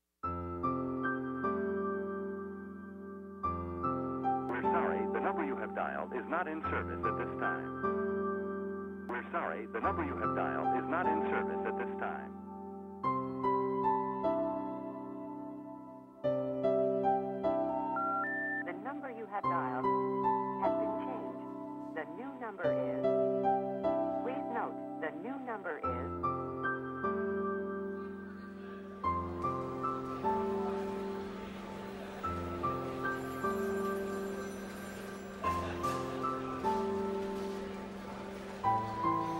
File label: bgm piano